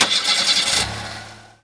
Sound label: START; DriverEd; Lessons